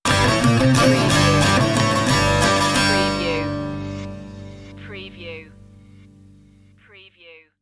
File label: eastern ceremony stinger